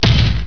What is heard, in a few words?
THUD; amazonia